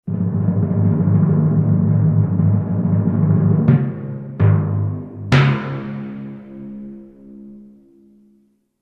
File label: timpani